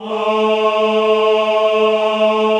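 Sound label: choir A2, men; Sound & Vision - Gigapack I CD 2 (Roland); Men-Combi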